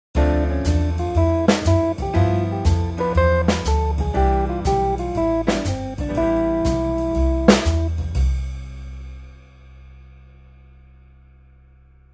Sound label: Scale; Tomosax